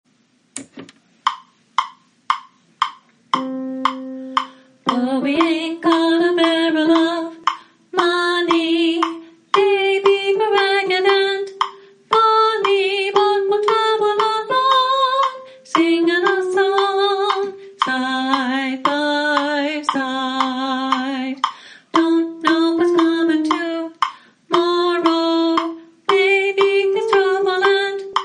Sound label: soprano